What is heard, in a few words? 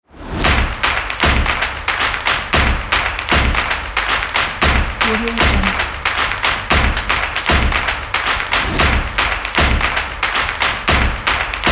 stomp promo beat